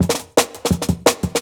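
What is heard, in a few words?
Jungle Breaks, 170 bpm; Skippin; Original Jungle Breaks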